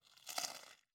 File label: bite regular; angband